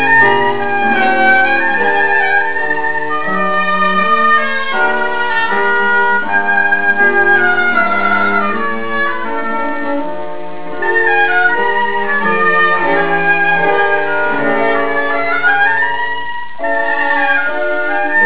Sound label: Oboe